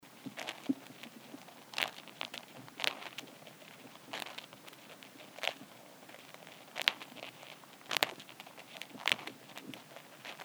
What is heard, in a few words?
feeding noises, in the ground; curiosoil